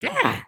Spawn; creatures organic; Lego; Strombie

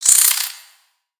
Spider Exert; Lego; creatures mechanical

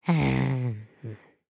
Idle Bored, creatures organic; Lego; Strombie

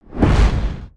Gorilla Slam Ground; creatures organic

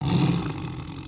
Sber Tooth Come Here, creatures pets

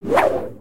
Gnarled Torch Spin

